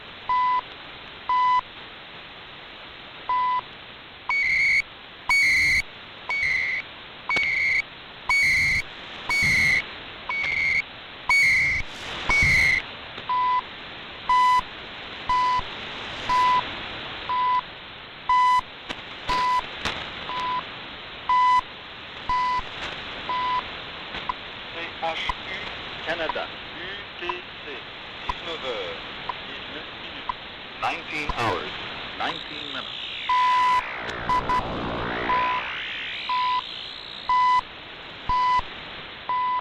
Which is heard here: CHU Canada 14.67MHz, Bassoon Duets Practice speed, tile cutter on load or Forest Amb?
CHU Canada 14.67MHz